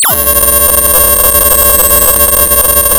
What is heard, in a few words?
pop, sound of a bike bell